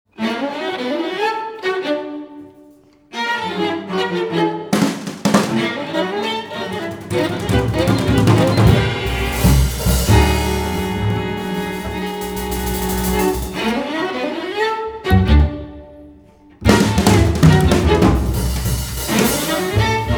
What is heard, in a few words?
Fast Loud